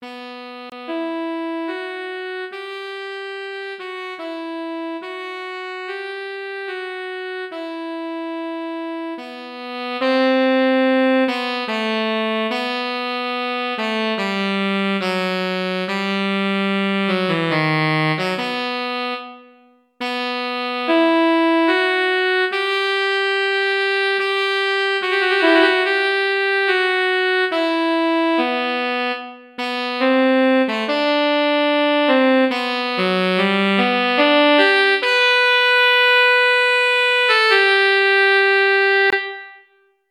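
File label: tenor sax slow; Region 23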